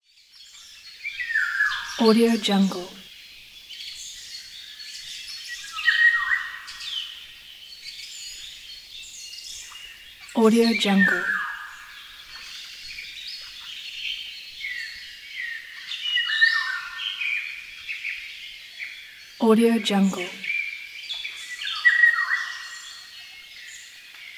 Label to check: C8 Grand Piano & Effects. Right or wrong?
Wrong